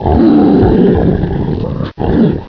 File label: death